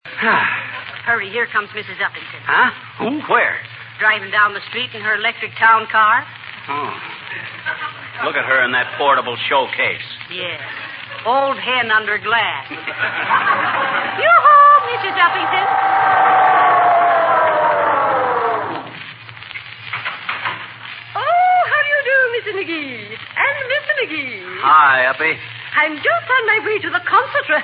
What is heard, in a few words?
Electric Car